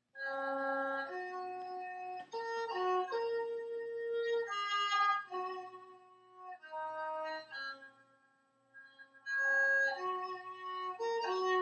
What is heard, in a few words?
amazing grace erhu